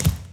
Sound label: gorefast step; kf2; gorefiend; slender